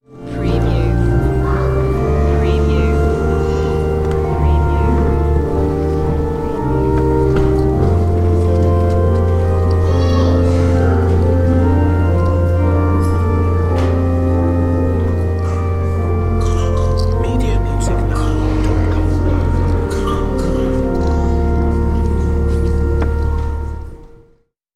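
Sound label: Church Organ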